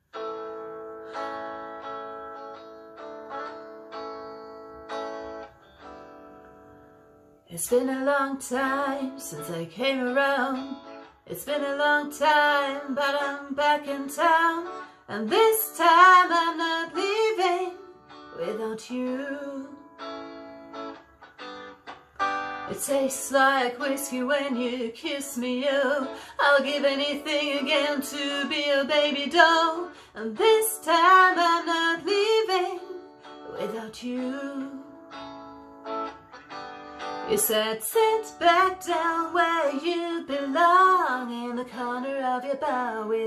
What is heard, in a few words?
Singing